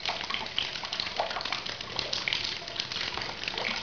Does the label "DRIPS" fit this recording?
Yes